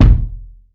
rich low end kick drum single shot e key

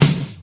classic prominent steel snare drum sample d key

